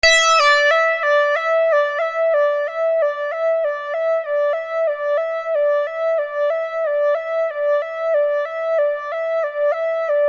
Keyboard

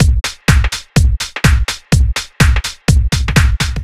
No Loop, Drum Loops 125bpm, Nu Dance Electro; Blip Jam

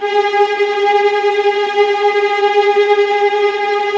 VIOLINT, Synclavier Strings; ILIO

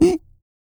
Vocal, Blues & Soul; CUICA MAN

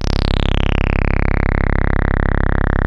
TAURUS E2, synthesizer

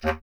B. Clarinet

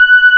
VO G5, ANA VOX, SYNTHESIZER; AKAI S6000 CD-ROM - Volume 1

